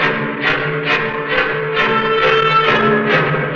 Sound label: strings, Loops, Sad